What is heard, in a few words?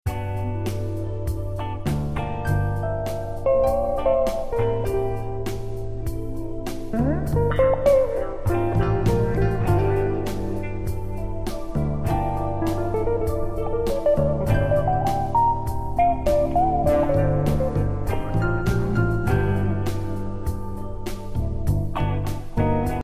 jazz